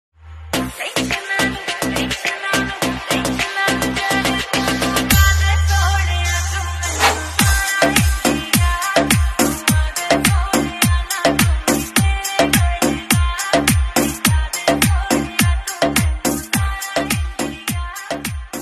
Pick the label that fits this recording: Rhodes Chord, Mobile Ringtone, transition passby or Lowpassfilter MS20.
Mobile Ringtone